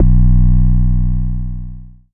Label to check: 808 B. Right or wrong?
Right